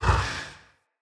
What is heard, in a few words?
Camel Idle Breath; Khaban Camel Vendor; Vocalizations